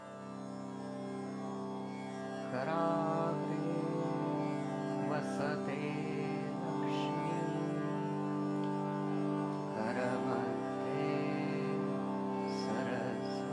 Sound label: Morning Chant; Karagre Vasate Lakshmi